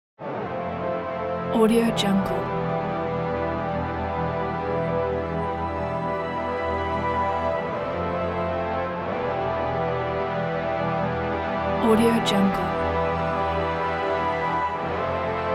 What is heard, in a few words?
final credits